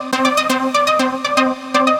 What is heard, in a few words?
Trance Synth